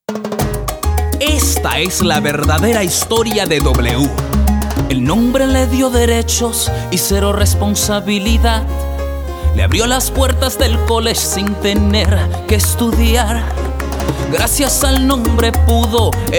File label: Bush music